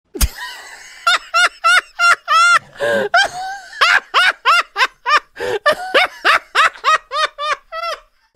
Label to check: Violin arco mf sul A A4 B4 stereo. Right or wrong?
Wrong